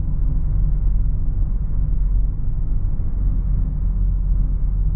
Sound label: jet idle; smite; janus